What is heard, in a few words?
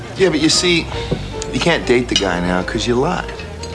sys default; sexcity